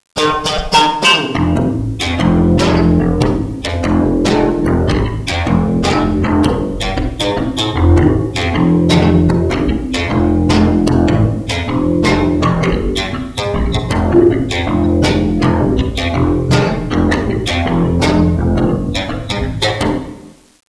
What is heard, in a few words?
bass